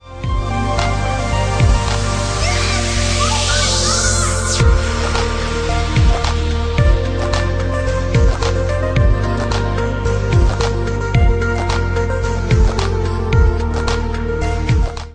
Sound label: yes oh my god chillstep